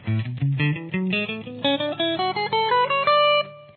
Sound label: A Major Scale 1st Position, guitar